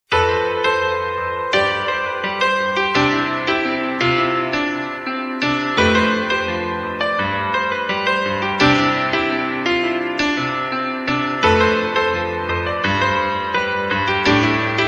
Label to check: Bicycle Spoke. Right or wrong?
Wrong